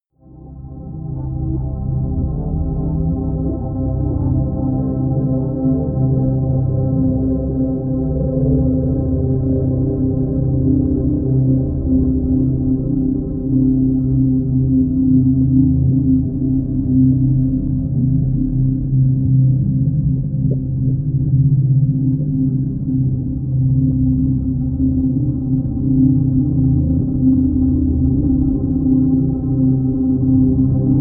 drone reflecting

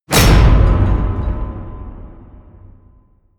hit metal

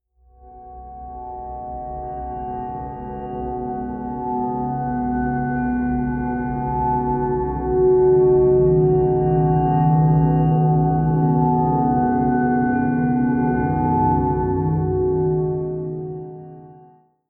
atmospheric peal deep, drones; musikelemente

